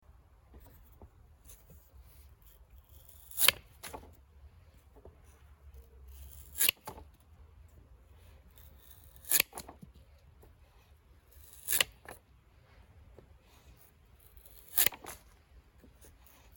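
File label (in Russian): bolshye sadovye sekatory, large garden shears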